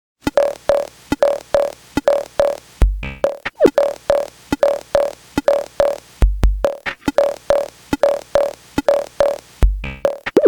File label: bongo plano